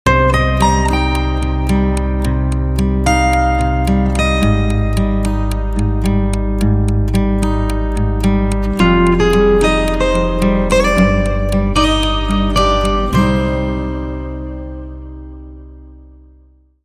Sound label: Clockwork Melancholy Short